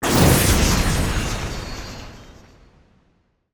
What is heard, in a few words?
sfx skill, ZombieSkill SFX; IdleRPG2024